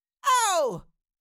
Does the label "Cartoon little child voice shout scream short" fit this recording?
Yes